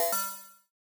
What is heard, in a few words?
Tune Notification